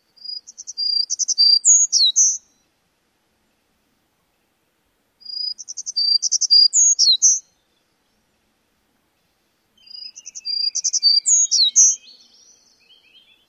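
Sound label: Townsend's warbler songs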